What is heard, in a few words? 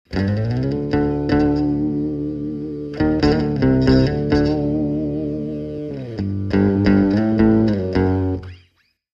Electric Guitar Low Tone